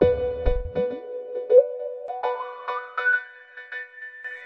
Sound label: resopiano waltz; SAMPLED MUSIC LOOPS